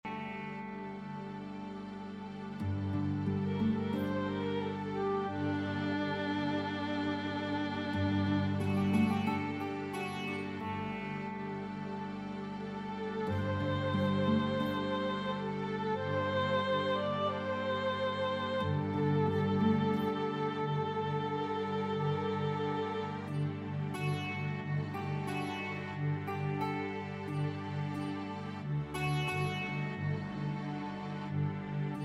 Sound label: interlude midi audio